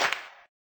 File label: Sex Smack